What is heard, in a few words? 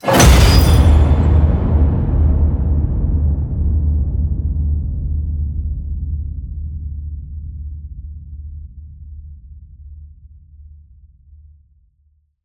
HIT Metal Crash, Cinematic Hits Designed